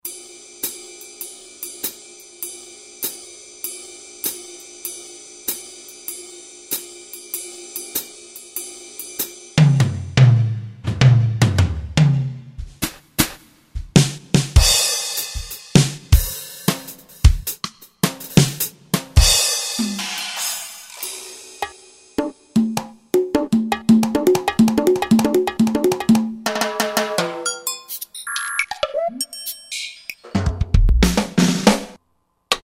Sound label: Jazz Drum Set 2, Roland JV-2080; Rhythm